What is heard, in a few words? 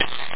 little noise